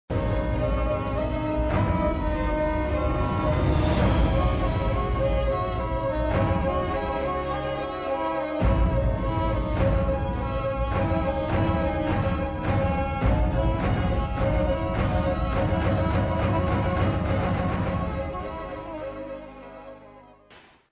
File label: bgm; agent sai srinivasa athreya; naveen polishetty